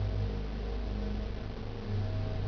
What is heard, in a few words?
power